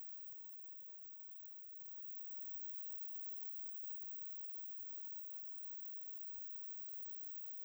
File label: single pickup, Gas Leak; Handheld Acoustic Imager